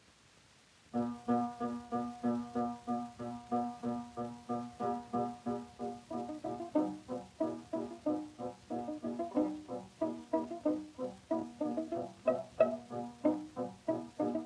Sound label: Piano Trio in E minor, Allegretto